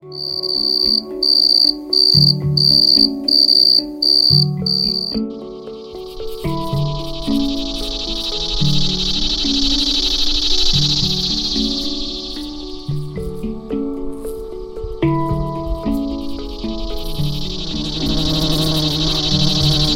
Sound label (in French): hang